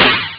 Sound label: smash, drums; OctaMED SoundStudio V1